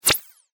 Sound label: Pneumatic Hose, Scifi, Disconnect, Expel; Science, Fiction; Spacecraft